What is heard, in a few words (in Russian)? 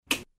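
один удар топориком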